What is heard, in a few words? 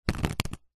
leisure playing cards stack fast; zapsplat